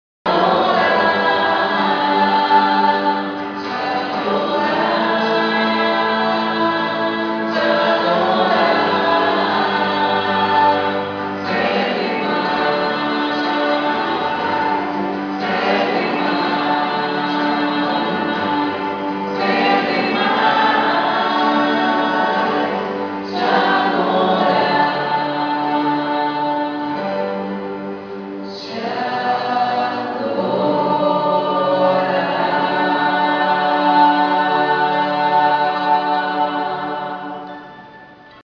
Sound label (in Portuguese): refrão